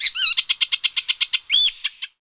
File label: bird